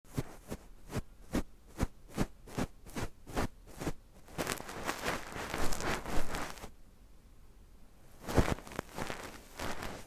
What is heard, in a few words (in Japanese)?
左耳 ふわふわ仕上げ, ASMR